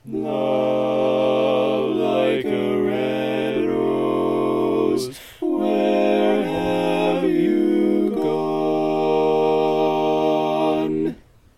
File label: All Parts, Barbershop Tags